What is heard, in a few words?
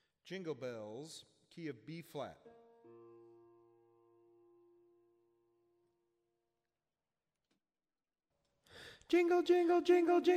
Jingle Bells tenor